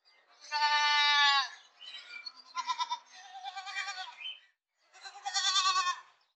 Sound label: goat sounds